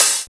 Hihat Open

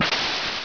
ice ball; Open Zelda; Quest Designer 2.0.4b

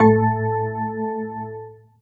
paper loop; There; ThereClient

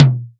Tom; Drums

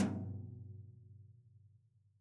Tom Brush Hit Soft Sizzle; One Shots; Drums

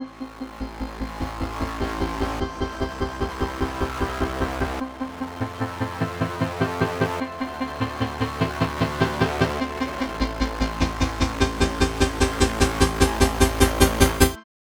Wavetable Pads, Synth; Loops; Tonal; Ableton Live 12 Lite; Core Library

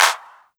Clap 808; One Shots; Drums; Ableton Live 12 Lite; Core Library

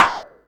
Clap Swept Metallic, Drums; One Shots; Ableton Live 12 Lite; Core Library

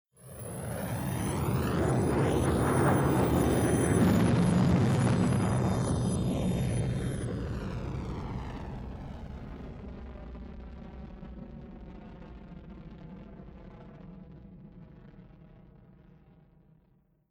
Desert Comet In Sky; Journey

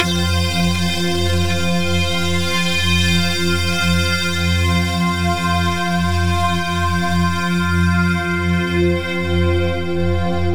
F4, Synths Multisamples; Limette; Ableton Live 12 Lite; Core Library

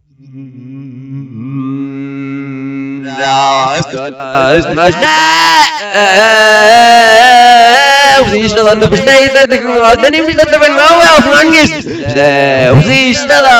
Ding Dong Backwards

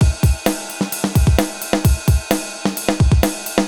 Lava Beat 130 bpm, drums; Loops; Ableton Live 12 Lite; Core Library

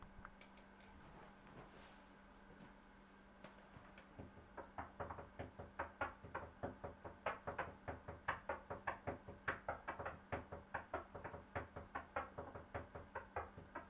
HBEAT, Instrumental; SeroNoise

